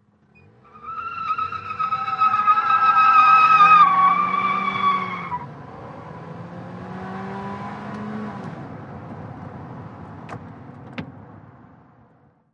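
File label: Small Car Accel Peel, compact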